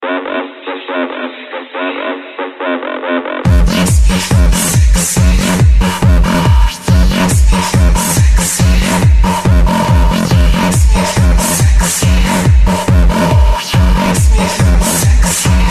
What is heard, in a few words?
sexy Bass